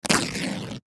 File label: Upholstery Rip, Upholstery Rip Tear Leather; Ripping; Foley